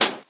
dark tone high hat sample c sharp key; one shots; hat samples; Duration 0:01; Samplerate 44100 Hz; Channels Mono

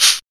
short shaker sound sample b key; Duration 0:01; Mono; percussion

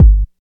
fluffy kickdrum sample g key

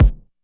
smooth kick sound F# key; kick drum samples

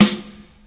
short reverb acoustic snare sound G sharp key; Duration 0:01; Samplerate 44100 Hz

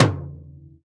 high floor tom drum c sharp key; Duration 0:01

